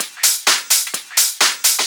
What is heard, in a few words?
Discohouse Loop 128 BPM